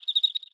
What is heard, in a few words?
Env Void Insect Sing